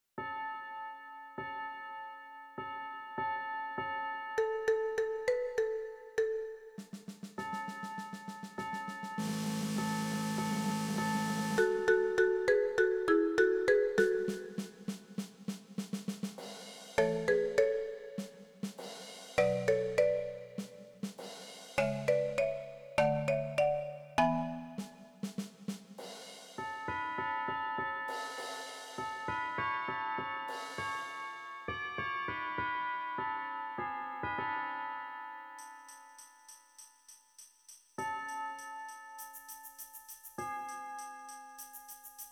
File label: percussion